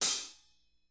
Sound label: hat, drum